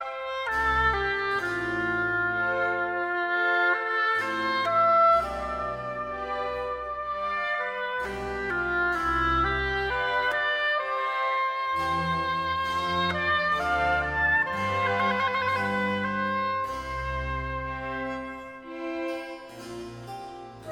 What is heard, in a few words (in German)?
concerto fuer oboe streicher und basso continuo adagio; concerto d moll bwv 1059r